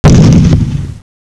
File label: expl; netpanzer